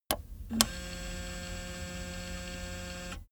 player car eject

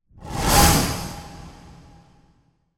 monster swell

